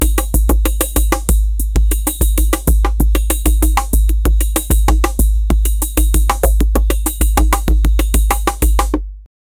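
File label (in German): typischer favela drum beat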